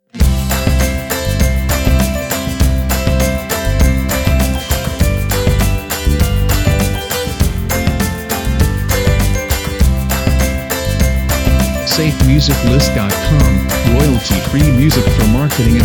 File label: Stay Positive Video Intro